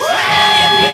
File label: dissonant scream